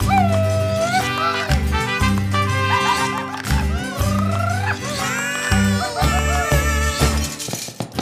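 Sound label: food world music inferno suspense intro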